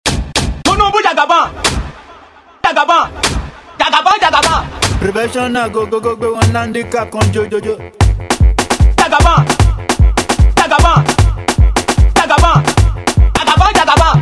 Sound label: Professional Beat